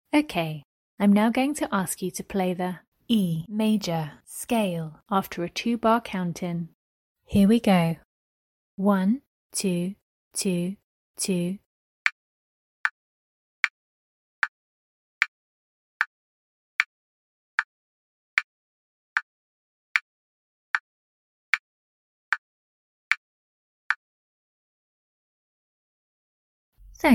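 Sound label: E major scale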